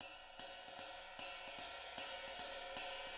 SWING; Sampleheads; Peter Erskine Living Drums

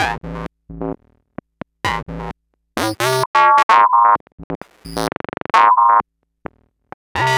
Synth Ultra Bass; Sonic Foundry (Sony Creative Software); Modular Electronica; Basses

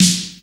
FM SD, MOD. DRUMS; East West; Global Sounds

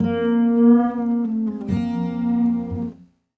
Sarangi, Acoustic String

